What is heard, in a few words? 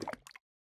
pointed dripstone drip lava; minecraft; block